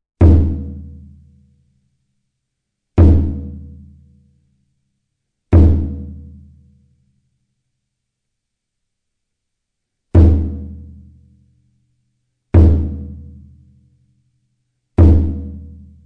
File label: Kyokushin Taiko; Kyokushin Taiko Final; Kyokushinkaikan Karate